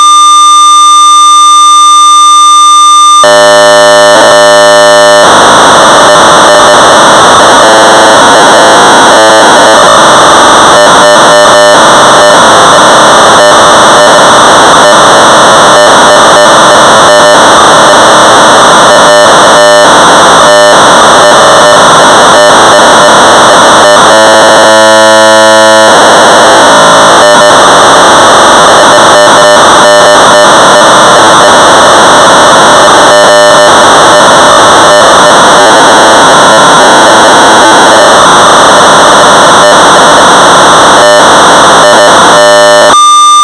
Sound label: Sorcerer 1200baud; Dybug2; M.E.Sevior; Global Software Network